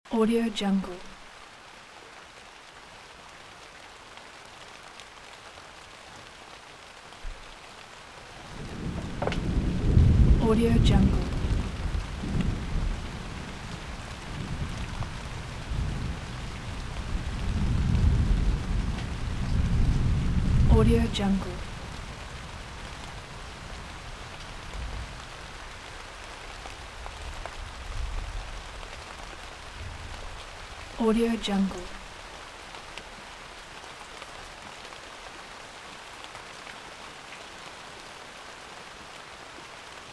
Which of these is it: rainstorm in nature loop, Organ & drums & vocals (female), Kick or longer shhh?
rainstorm in nature loop